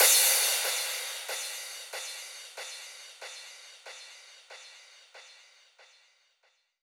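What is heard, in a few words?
drum Loop 140BPM Crash delay; Crash DLY; Vengeance - Essential Clubsounds Vol.5; VEC5 Drumloops; VEC5 Multi Loops 140BPM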